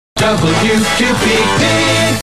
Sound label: jingle; WQPD